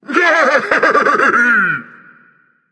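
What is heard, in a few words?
non verbal yay, voice; cernunnos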